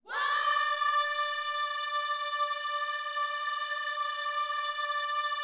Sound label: "Whoa", Gospel vocal, D5; Spectrasonics; Vocal Planet Vol.1 Gospel; akai